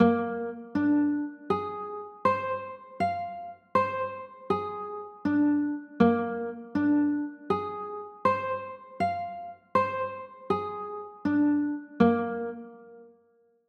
b flat 9, chords

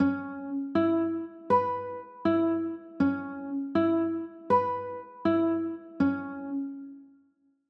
f sharp m11

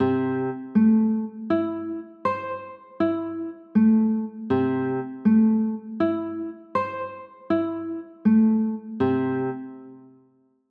a m, chords

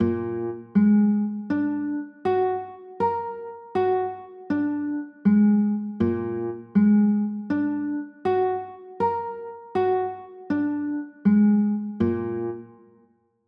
a sharp 7 sharp 9; a sharp 7 sharp 5; pos 2

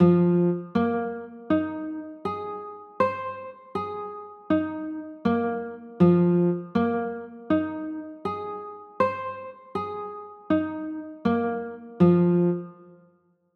f m11, chords

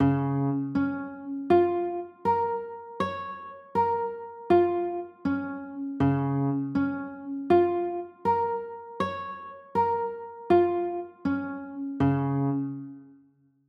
c sharp 6 9, chords

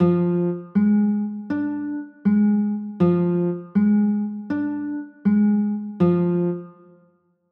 d dim, chords

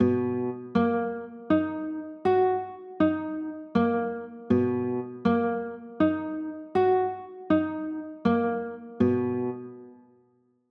b maj7, chords

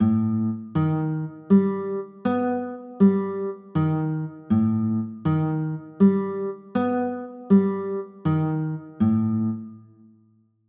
a 9b5, chords

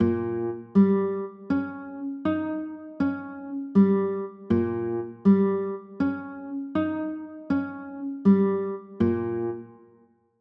e flat 7, chords

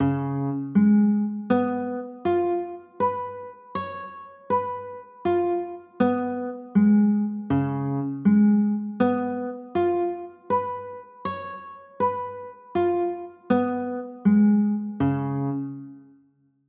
d flat 7

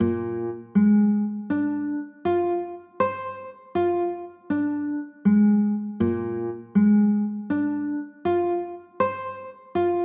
a sharp 6 9, chords

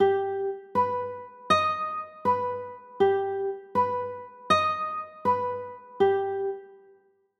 g aug

